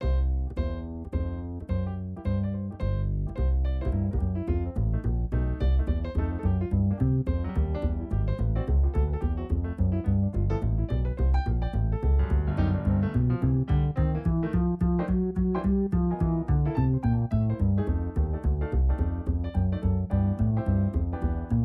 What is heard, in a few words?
Acoustic Bass